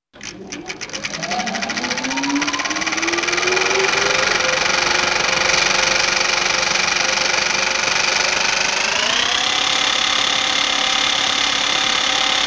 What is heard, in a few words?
PROJECTOR